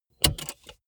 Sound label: car bmw e46 interior safety belt clasp release hard